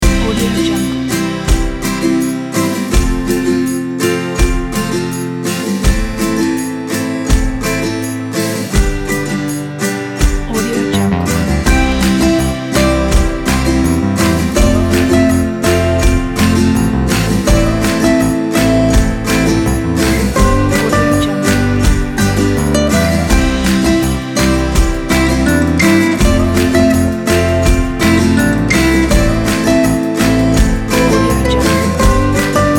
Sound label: folk